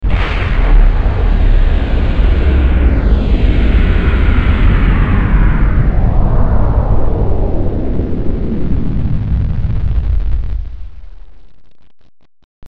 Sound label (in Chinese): 电视包装音乐